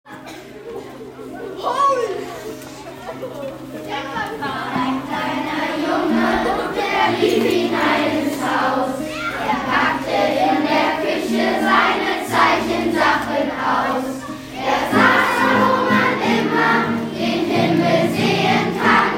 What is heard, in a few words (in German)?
Gesang Ukraine